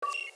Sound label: communications start transmission, computer